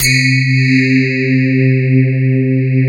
CMI PIANO; East West; Twisted Textures-BT

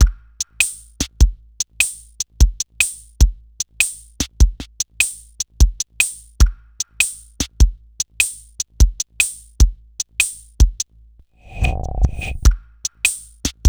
TRIPHOP; INIS; Funky Xtreams

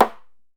DJEMBE

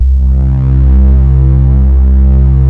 RESONANT; Pro-Rec - Synthsonic; Z1 PADS

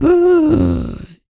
REGGAE, Vocal Planet World Voices

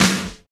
SNR SLAPB, Snares; Roland - Rhythm Section; Snares 5

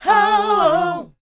SHOO B A#B, R&B & Dance, Vocal; ILIO; Vocal Planet VOL-4; SHOO BE 80

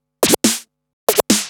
Scratch Fills 140BPM

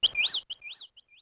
Tweet, Yamaha DD-35

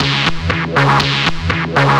Techno Synth

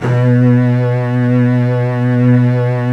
STRINGS

